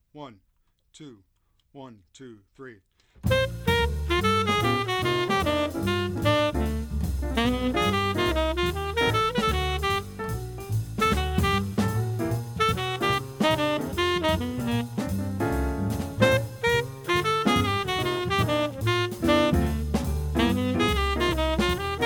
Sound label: tenor mix